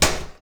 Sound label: SWITCH, household; AKAI Anime Sound FX